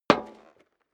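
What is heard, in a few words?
step dress boots metal dry single left, footsteps; schritte; menschen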